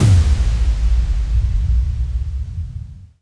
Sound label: Bass Impact